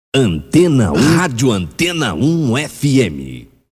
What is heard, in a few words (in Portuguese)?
Vinheta; Antena 1 SP